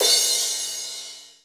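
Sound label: processed cymbal crash, drums; Crypton - DrumBase5000; PROC.DRUMS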